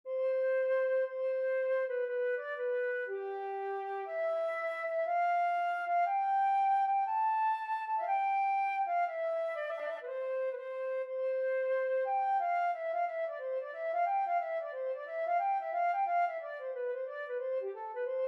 Doctor Delaney flute

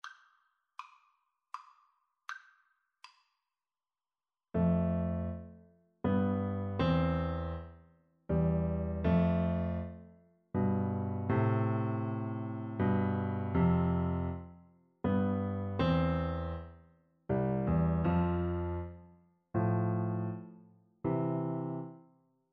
holst jupiter, Piano Duet

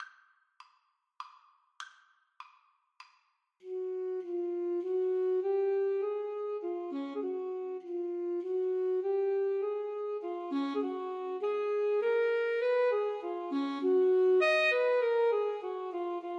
Menuett in C L Mozart A SAX duet

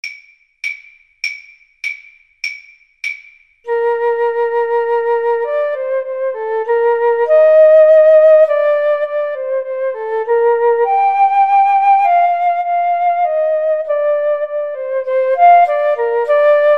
Flute Clarinet Duet; Mozart 12 9

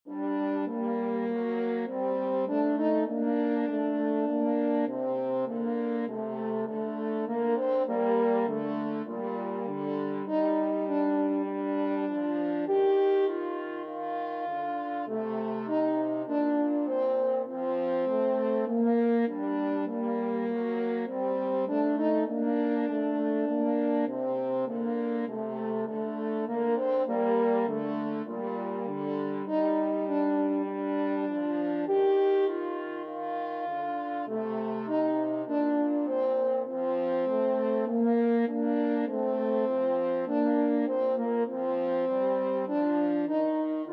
french horn duet

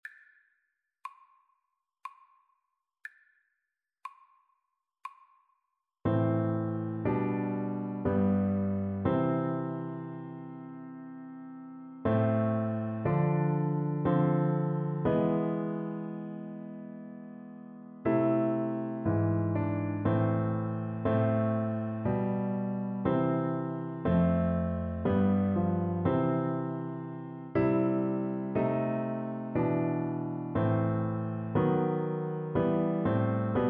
Recorder; bach come sweet death

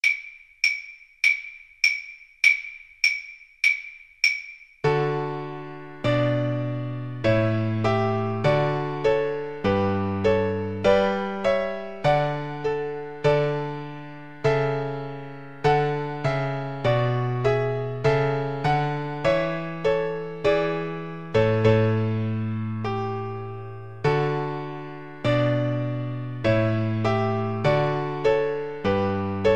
Cello

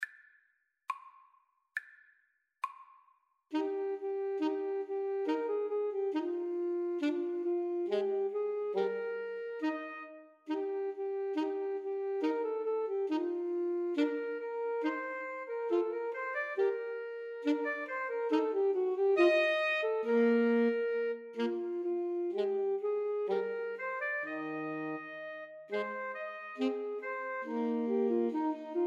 schubert rose, Alto Sax Trio

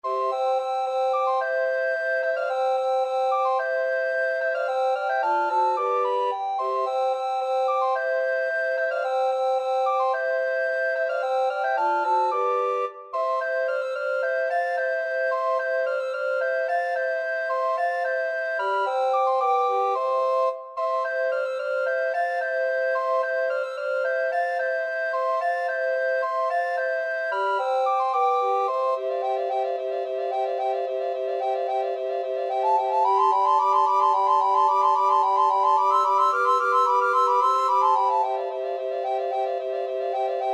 spring, recorder

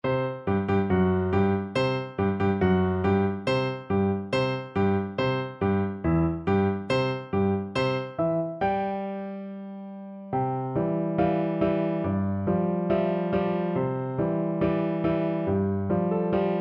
schubert march militaires, piano duet

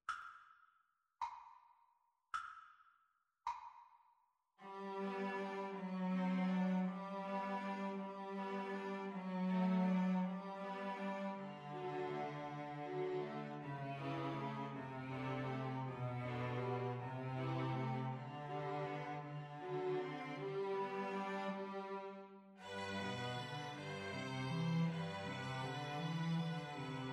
String trio

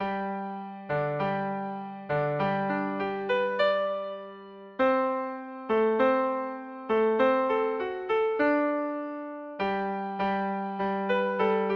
eine kleine, piano

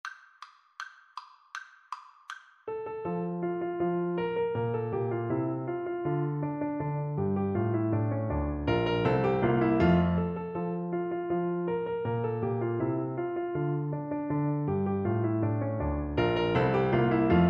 Euphonium

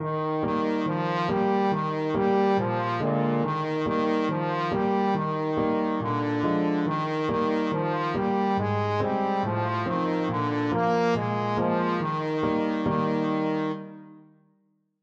trombone

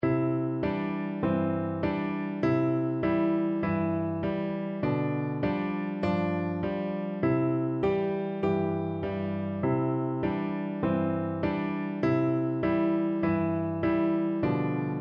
mary had a little lamb, piano duet

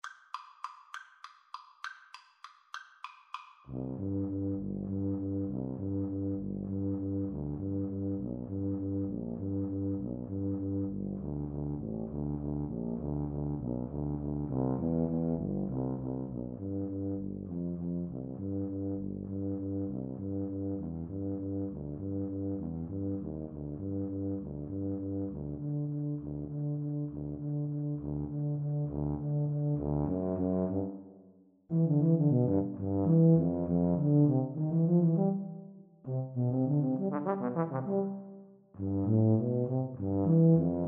tchaikovsky waltz, Tuba Duet

